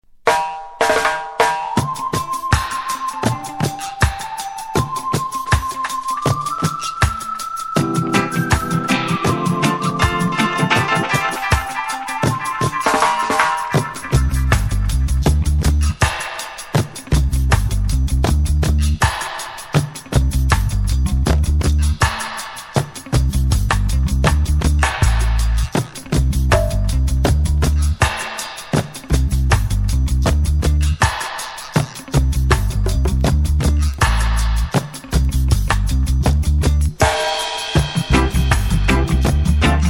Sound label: Bingi Bongo Dub